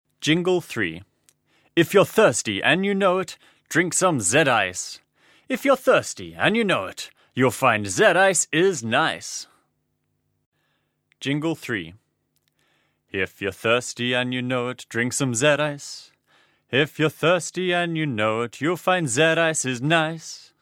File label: Jingle; poems and songs